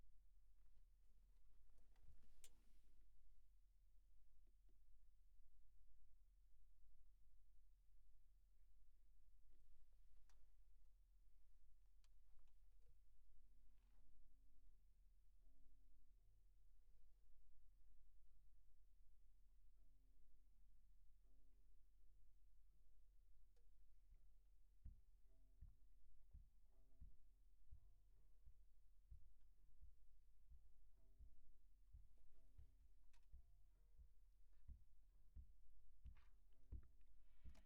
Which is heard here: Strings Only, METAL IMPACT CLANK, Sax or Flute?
Sax